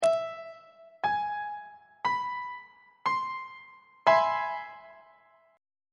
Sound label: b sharp augmented major 7th 1st inversion; on treble clef